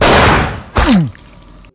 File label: gunshot uh; Crash Bang Boom